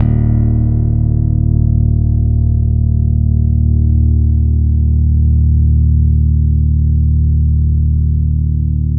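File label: finger E 2, 8-string bass; Spectrasonics; Bass Legends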